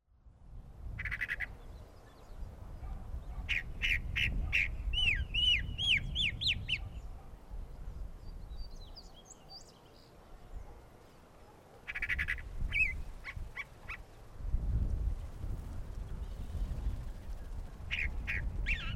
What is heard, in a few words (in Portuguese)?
Sabiá-do-campo Mimus saturninus; Jacauna